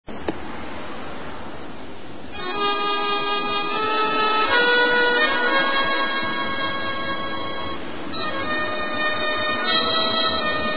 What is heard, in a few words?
mouth organ